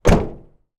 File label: truck door close; transportation